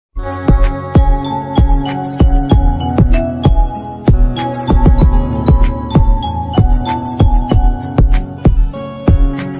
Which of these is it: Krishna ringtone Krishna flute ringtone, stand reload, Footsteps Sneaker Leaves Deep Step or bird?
Krishna ringtone Krishna flute ringtone